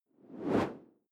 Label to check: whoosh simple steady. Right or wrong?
Right